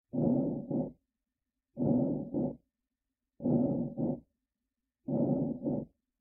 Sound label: Tension sounds; medic kat